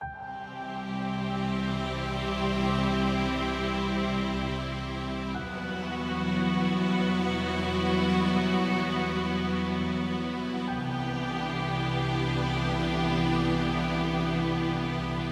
Orchestral Synth Pensive Bliss, Cinematic Trailer Loops